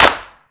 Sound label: clap sample e key; percussion; single hits one shots